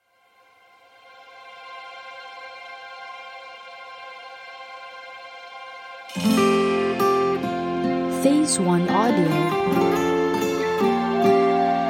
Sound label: Jingle Pop Style